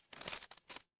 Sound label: paper fabric inventory